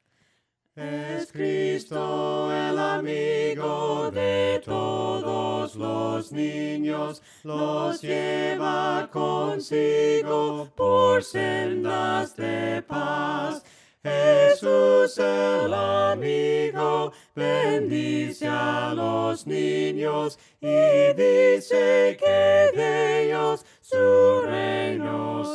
ES CRISTO EL AMIGO VOCES, himnos a capella; cantos del camino

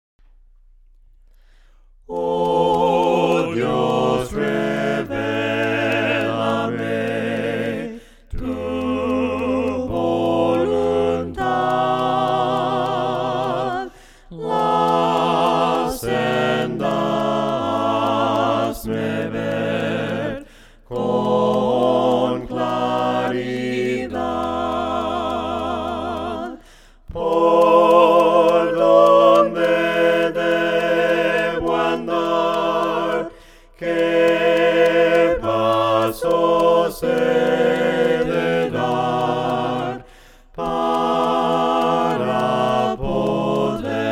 VOCES, himnos a capella; cantos del camino; OH DIOS REVELAME TU VOLUNTAD

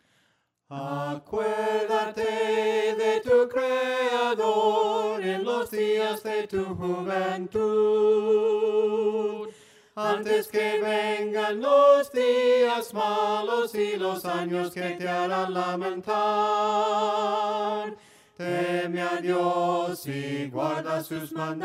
VOCES, himnos a capella; cantos del camino

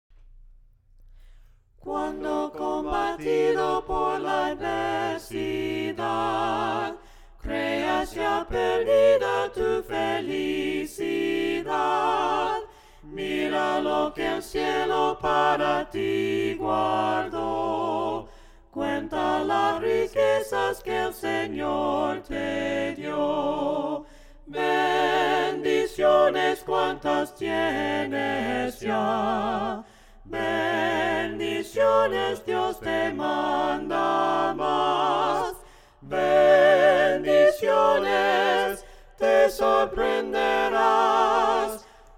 BENDICIONES CUANTAS TIENES YA VOCES

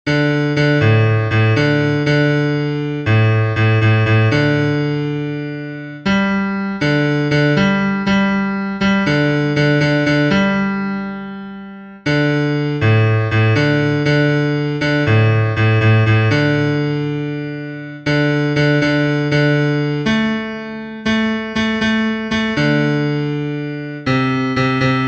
BAJO, himnos en piano; SIGUE EL CAMINO; cantos del camino

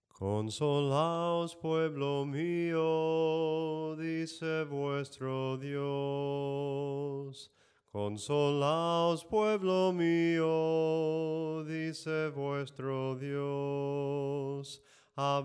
SOPRANO CANTADO; cantos del camino

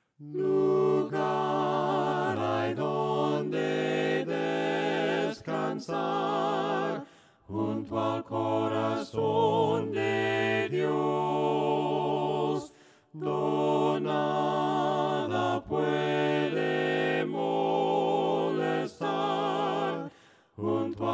voces; LUGAR HAY DONDE DESCANSAR; cantos del camino

